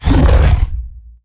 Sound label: Stealth Attk; Evolve; Behemoth